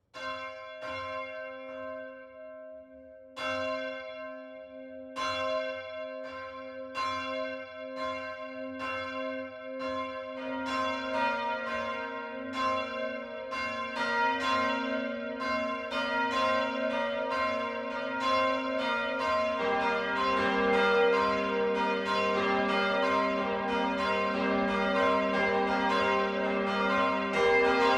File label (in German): Glockengelaut; Friedberg